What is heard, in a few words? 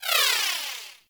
Skip Rope Despawn; Warframe; Characters; Pets; Moa; Tenacious